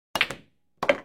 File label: Billiard close; Nokia; 7376